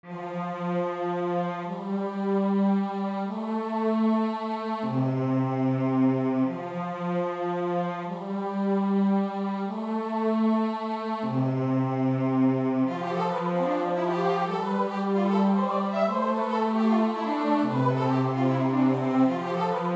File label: RPG Music